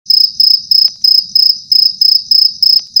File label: Cockroach Loud